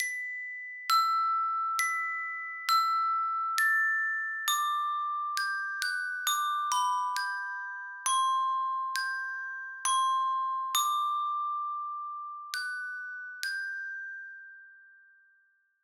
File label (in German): Glocken